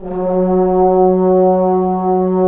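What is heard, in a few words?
brass trombones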